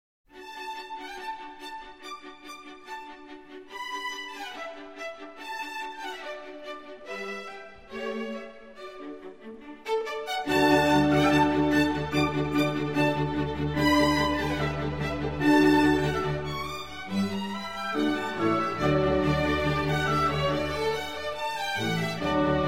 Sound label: piano